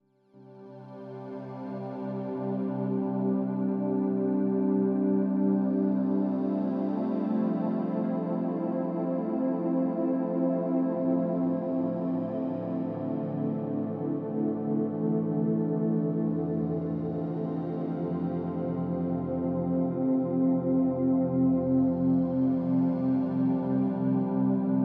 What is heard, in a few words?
Relaxation Loop